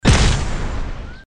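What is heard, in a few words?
Bomb Explosion; Dude Theft War; Android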